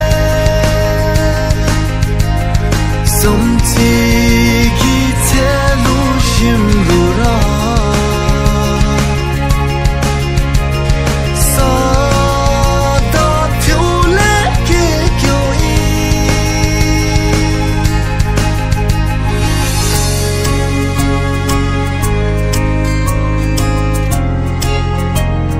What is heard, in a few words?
bhutanese ringtone; Bhutanese Ringtones